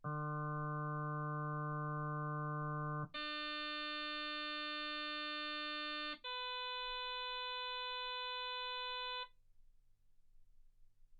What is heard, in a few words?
harm sinus 48kHz